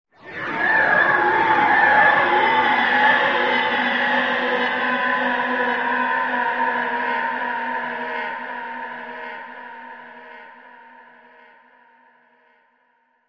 Pig Squeal; Eerie Multiple Lowered Pitches, With Reverb And Echo.; Use For Monster.